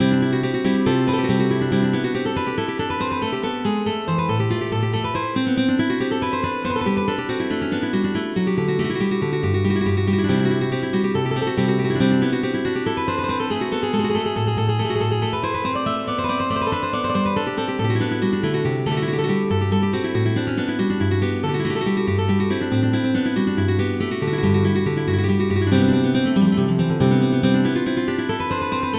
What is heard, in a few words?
Calata, MIDI